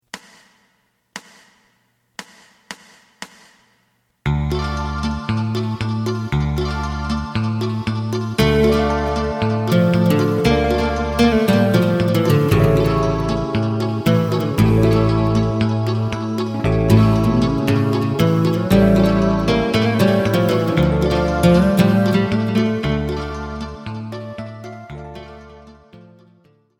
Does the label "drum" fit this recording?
Yes